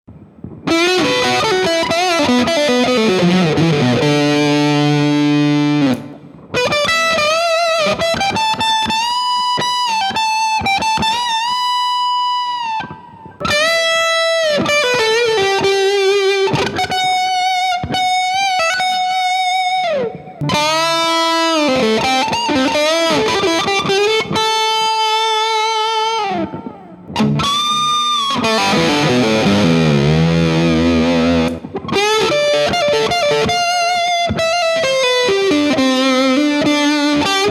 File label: RAMS HEAD RAW CLIPS EMG TWIN LEADS